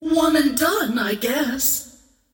scream; queenofpain

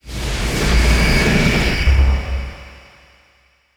hunger blade channel; weapons; dota; kez

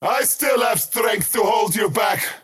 skywrath crown deny pain; skywrath mage

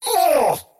skywrath crown pain; skywrath mage

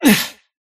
attack pain; dota; kez

